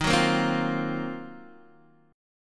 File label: D sharp Minor 6th Add 9th D sharp Guitar DADGAD, chord